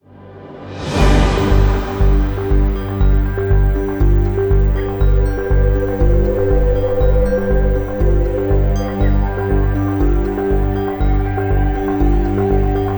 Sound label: Fast money Countdown Music; Family Feud; PS4 Stadia Switch Xbox One Xbox Series XS